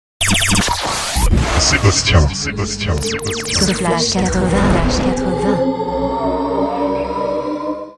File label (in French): jingle